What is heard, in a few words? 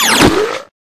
hit